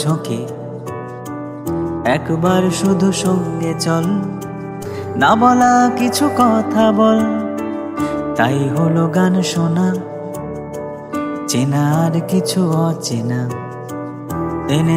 bengali ringtone

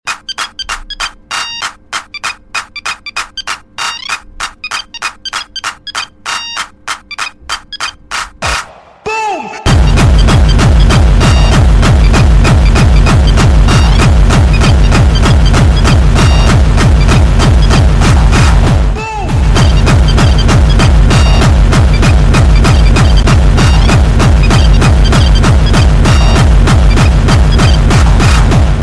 nokia hardcore ringtone

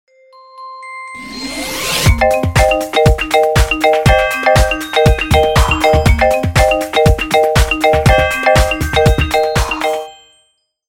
ringtone, galaxy ringtones

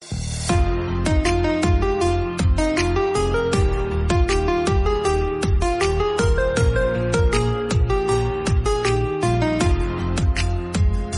instrumental background music tone ringtone; samsung ringtones

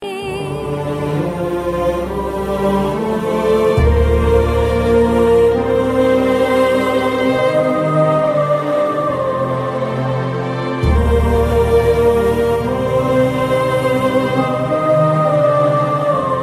sad ringtone

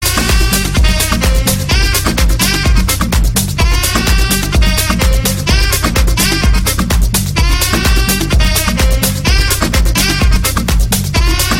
sax jazz house ringtone

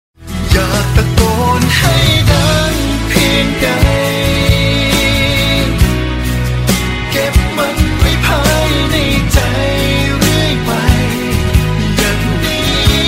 punjabi ringtone

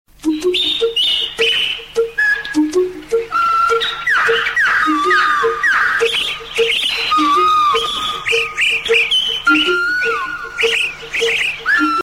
nightingale call ringtone

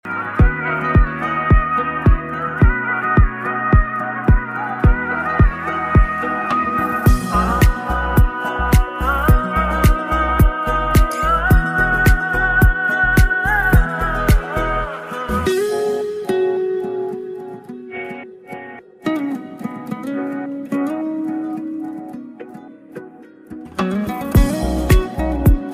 drama ringtone; chand tara drama

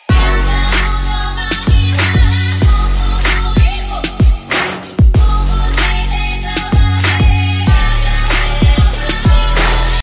hip hop beat ringtone

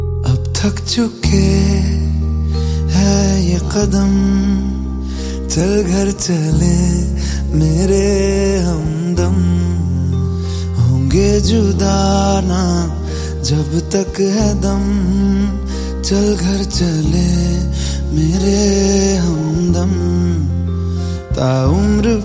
ringtone, bollywood ringtones

